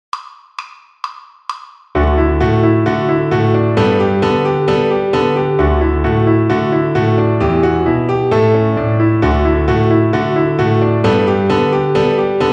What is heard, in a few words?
Piano Accompaniments